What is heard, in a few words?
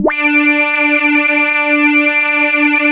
CHUNKY, Drums; Drums In Your FACE!